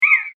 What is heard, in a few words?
combobreak; Crimson Stella